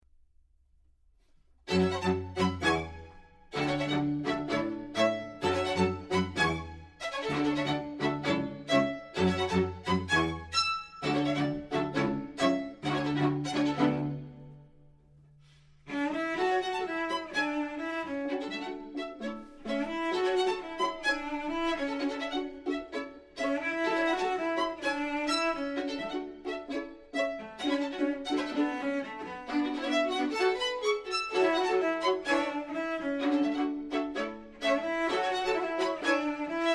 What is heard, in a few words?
strings